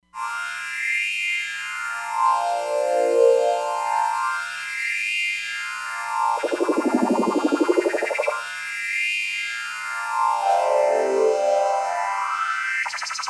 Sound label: Sand Pad, Roland Fantom XR